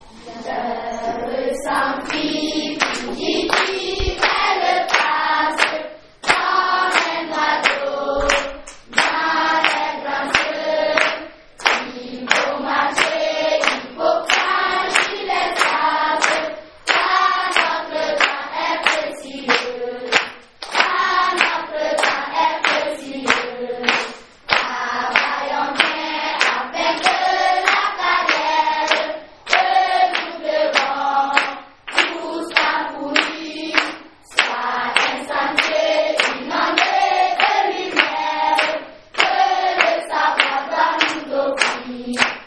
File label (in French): Chant des enfant; voyage au burkina janvier 2016